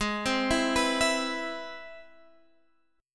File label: C Augmented Major 7th G sharp